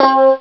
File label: Synth Guitar; rare gems